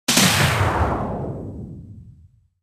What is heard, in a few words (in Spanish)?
entrada fx